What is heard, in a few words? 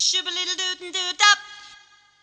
Sing Voice Scat, Choir